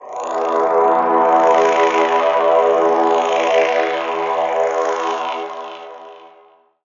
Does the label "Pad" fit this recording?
Yes